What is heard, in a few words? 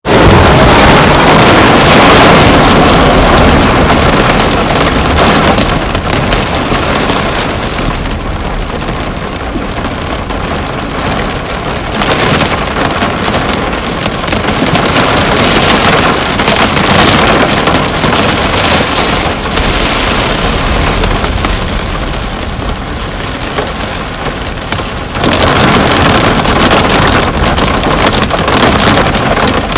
Raining on the Bunurong Coast